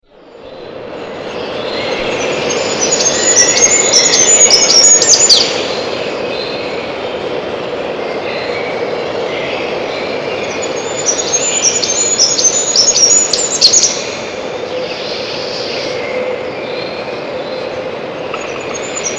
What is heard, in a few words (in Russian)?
пеночка печальная, birds